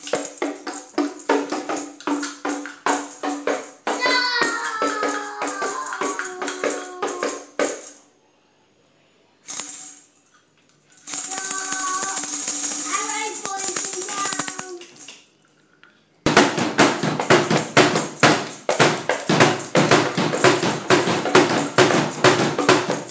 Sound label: drumming